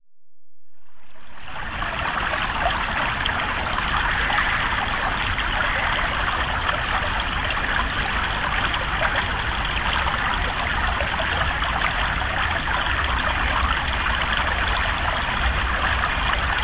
small stream in singleton park swansea

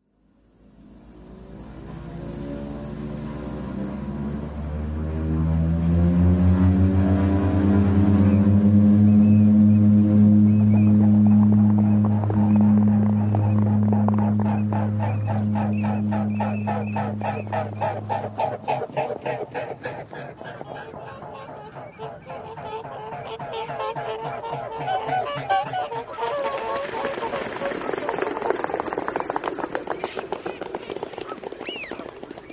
take off

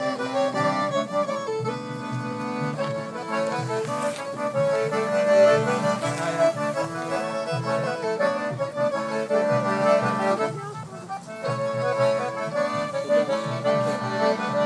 folk duo; sleaford

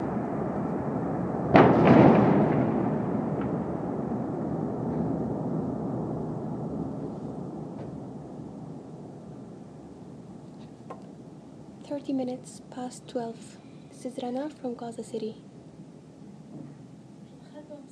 massive explosion, gaza

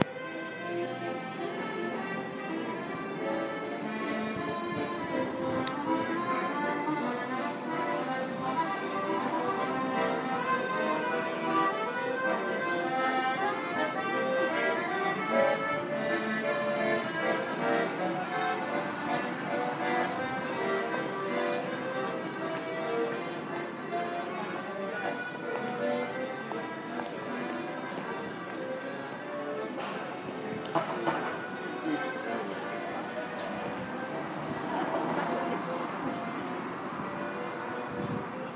busker

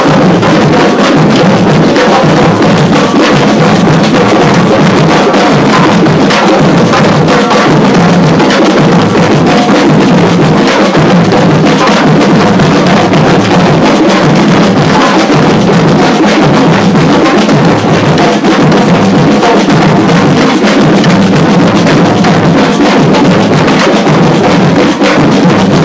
drumming in kings heath

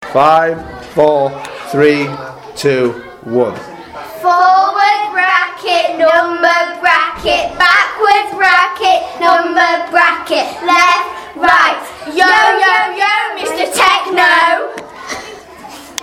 robomind commands rap

